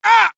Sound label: hurt med; cj